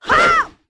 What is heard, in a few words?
Sword Spin Stereo; Link's sword-spin attack (stereo versions); The Wind Waker